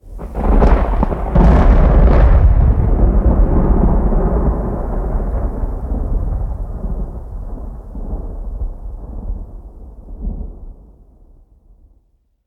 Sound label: Distant Strike